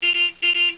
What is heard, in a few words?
beep beep